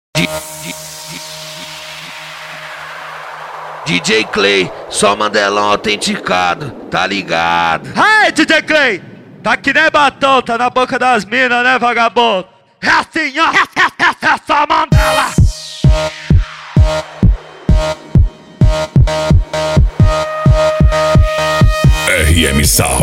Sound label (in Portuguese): som automotivo